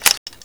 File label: Inf Weapons Foley 3rd; Red Orchestra 41-45